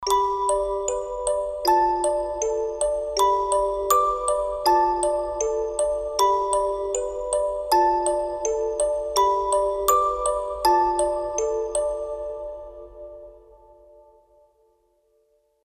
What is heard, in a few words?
instruments plucked musicbox